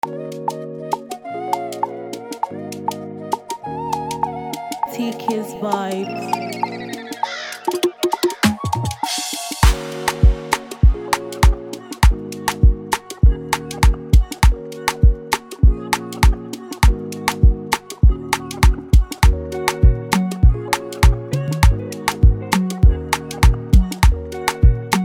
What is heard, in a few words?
Freebeat Afrobeat Type; Prod By Tkeeyzbeats